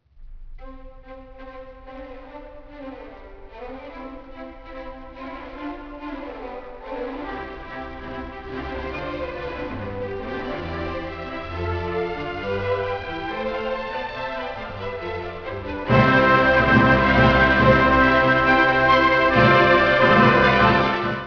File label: mozart piano